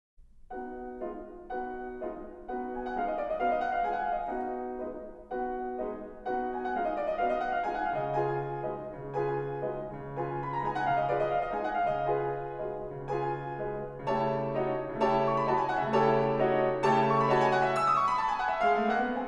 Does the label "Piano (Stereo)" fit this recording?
Yes